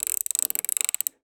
Plastic Knob

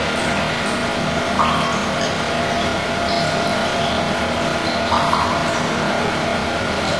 hum drip; hl2

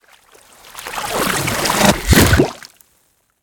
designed water transition impact; BluezoneCorp

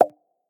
Mouth Pop; Sounds Visual; 50 Flat Design Sound Effects

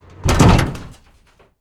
industrial lever switch; BluezoneCorp

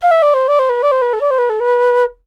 Transverse flute Bamboo; MatiasMacSD

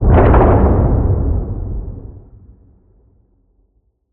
Distant Blast

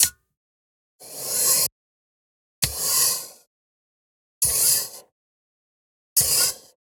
Hydraulic Pressure Releasing, Industrial Robot; Stereo; Justsoundeffects

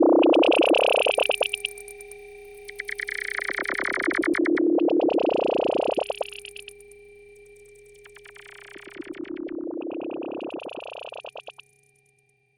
Interface Bleep Glitch; MatiasMacSD; PRESS - INTERFACE SOUNDS

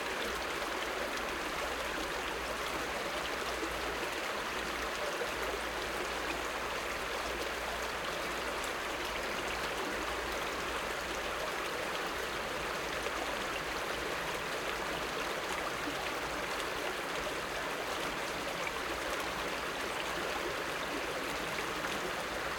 River; Stefano Cremona; Rivers, Streams, Creeks

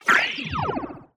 Mecha Energy Sword Cut, Anime Studio; Rogue Waves

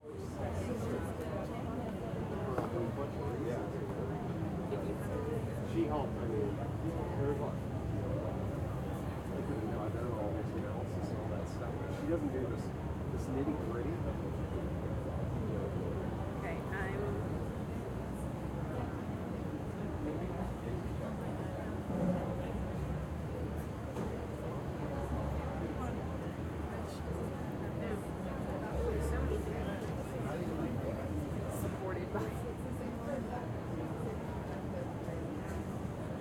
Crowd Exterior Cafe Restaurant Light, Birds, Crow; Surround; 5.1; 2496SoundEffects; Surround In a Crowd 5.1

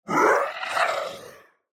Creature Alien Death; MatiasMacSD; CREATURES ZONE

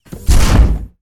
footstep mech mega, Robotic Lifeforms; SoundMorph

